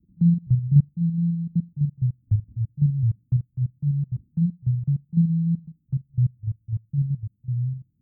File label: bass whistle down 3 5 octaves